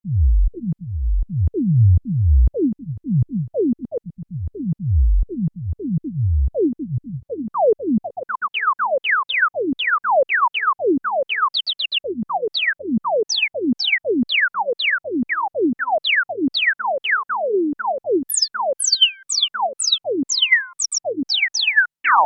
access virus c filter lpf12 + lpf12 ser q100

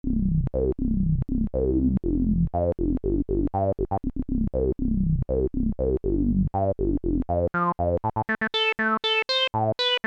kawai k11 filter lpf q100

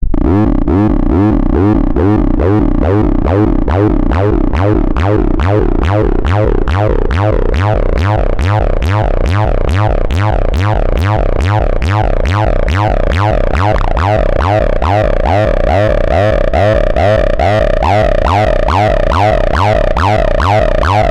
e-mu morpheus 303 external fx; TB: E-mu Morpheus, Delay by Logic

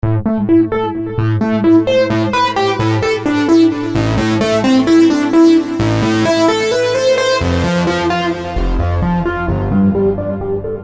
e-phonic invader vsti sounds external fx

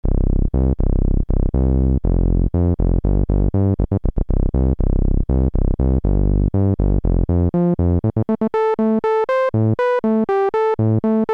e-mu morpheus filter demo lpf

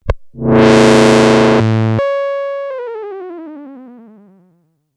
mam freebass fb383 sounds, switch on external fx

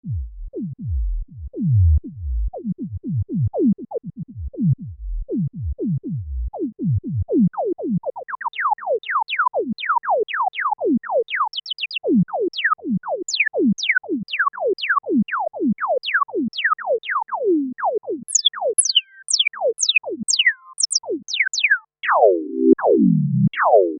roland v-synth xt filter tvf24db q100

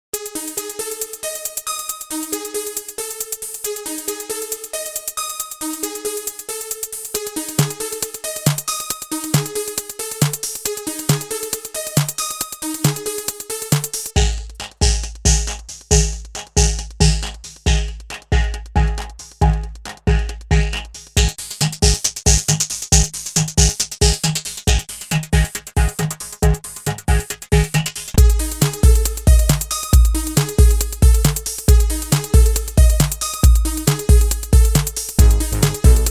quasimidi sirius vocodex; factory demo

